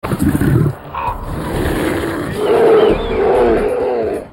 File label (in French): ours brun d Alaska cri, cris d animaux